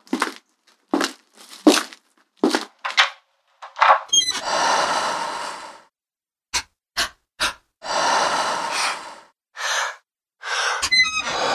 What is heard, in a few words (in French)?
reveil